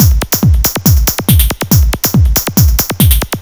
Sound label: loop, Drums; TechnoMusicMaker